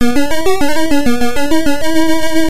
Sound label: Congo Bongo Death, ringtones